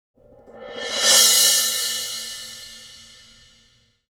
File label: Splash Roll, cymbals; Sonic Foundry (Sony Creative Software); Joe Vitale Latin Percussion